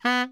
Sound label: BARI FF B 2, Sax; Greytsounds - Philip Wolfe David Crozier's Saxophones; BARI SHORTS